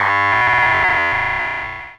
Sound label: drown